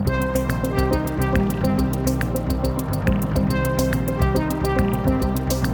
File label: Electro